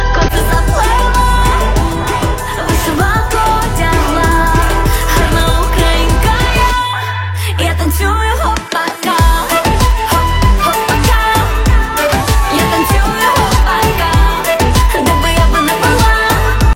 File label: gopak